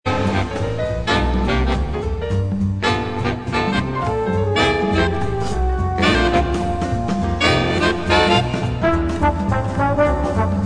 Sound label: carillon; Allerlei 2004-2005